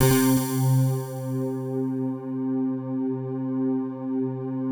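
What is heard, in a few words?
PAD, atmospheric pads; Best Service; XX Large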